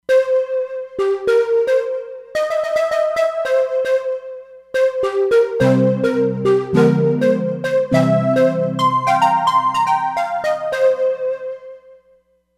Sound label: Rhythm Flutes, E-mu Proteus-3; Factory patches